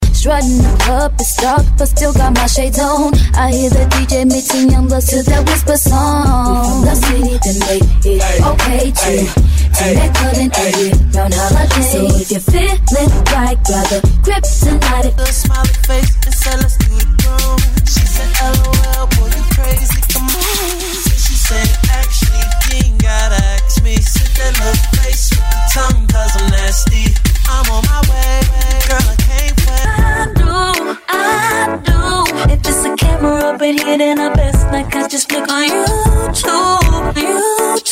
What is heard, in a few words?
Throwback Hip Hop RNB Megamix